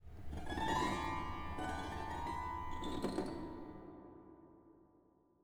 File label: cave, ambient; minecraft; SMCNV-pack